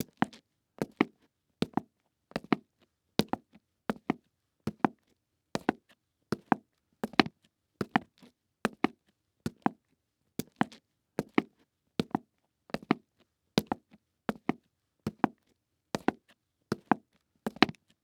Foley Footstep Hard Sole Dress Shoe Walking On Cement; Foley Footsteps